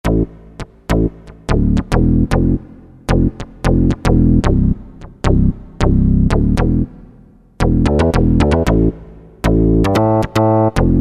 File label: SYN.BASS, Roland SN-U110-09 Guitar And Keyboards